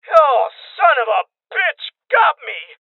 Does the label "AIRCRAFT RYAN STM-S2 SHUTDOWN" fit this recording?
No